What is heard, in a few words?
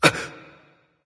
gasp; smite; voicepack; ullr